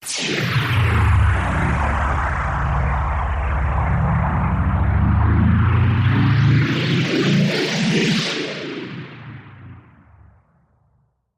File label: Big Heavy Flutter, Taylor Grand